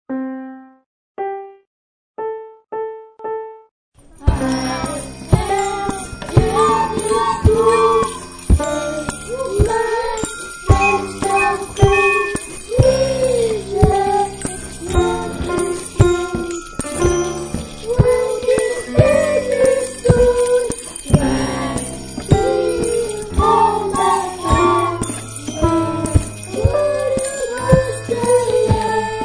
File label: sine